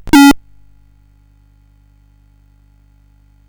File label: sid CSG8580R5; Frogger II-Three Deep